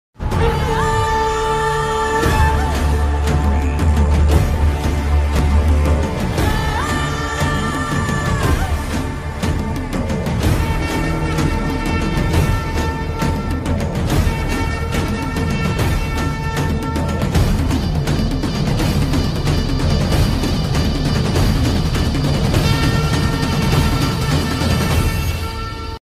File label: bgm ringtone; Chiyaan Vikram